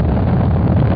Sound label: thrust; Skrap